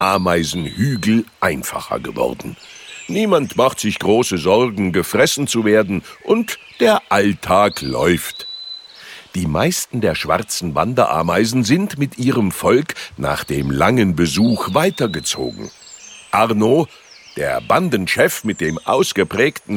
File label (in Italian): Applauso